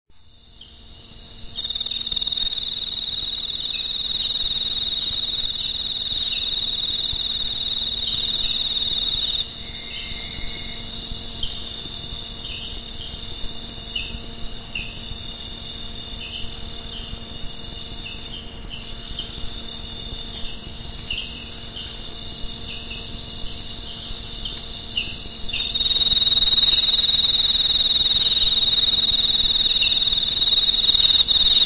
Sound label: tree frogs and cricket